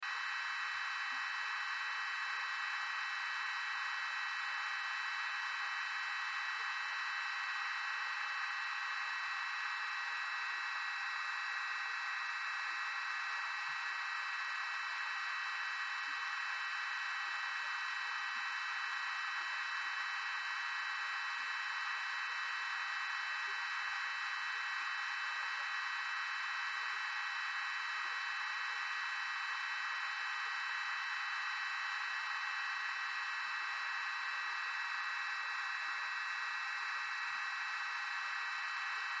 Sci Fi Static, Sci Fi Static Monitor Hum Buzz Radio; Communication; Blastwave